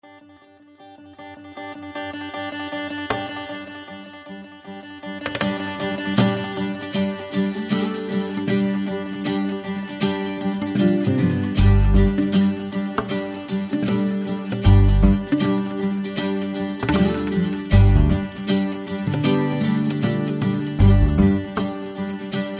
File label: BLUE GRASS AND WEEDS